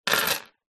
household jewelry necklace silver charms set down wood table; zapsplat